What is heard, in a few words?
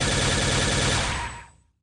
Gatling Laser; Fo1